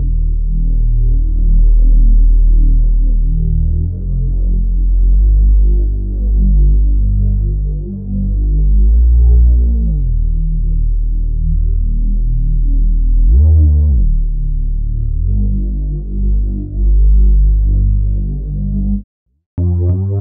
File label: Synth loop 95 Gm, Synth Bass Loops; Slate Digital BASSHOLE Sample Pack